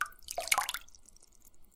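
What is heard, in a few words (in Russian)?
вода поток спокойный нежный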